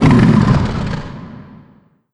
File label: pain; cyberdemon